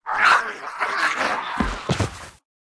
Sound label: gnoll dead, monster